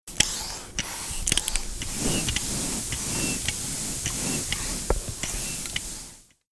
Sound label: TOYS